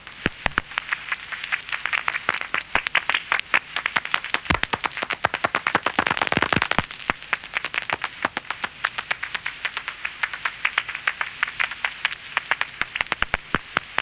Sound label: barbastelle, bats